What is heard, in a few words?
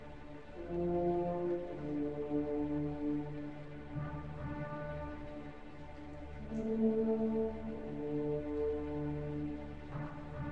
Symphony in D minor Adagio; Bruckner; WAB 109; original 1894 version ed A Orel